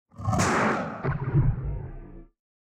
Stab Whoosh Front To Rear, Stab Bright Musical Tonal Light Phase; Production Element Imaging Element Accent Transition; Blastwave